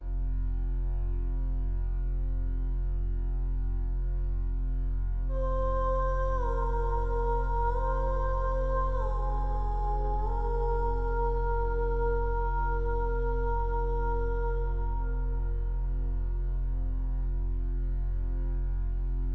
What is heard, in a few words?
Music Level Abandoned Range Intro